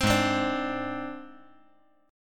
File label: G sharp Major Flat 5th, chord; G#Mb5 strummed; JGuitar